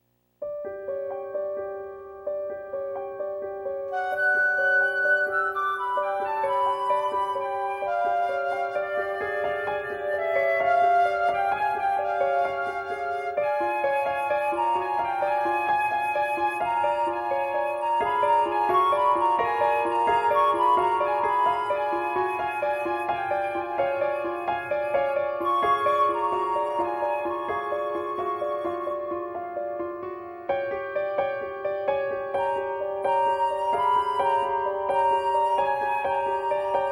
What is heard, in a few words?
Flute Theme